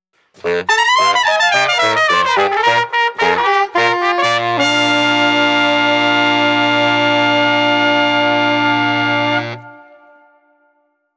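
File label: Street Brass New Orleans Transitions, 95 bpm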